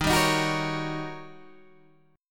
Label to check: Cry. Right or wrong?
Wrong